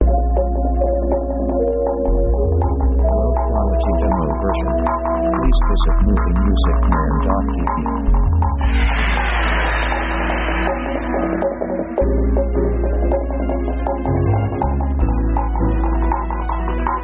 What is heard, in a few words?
liquid rhythm; synth themes